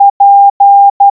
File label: oubun p, morse